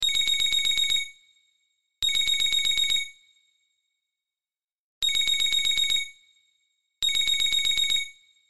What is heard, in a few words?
modern ring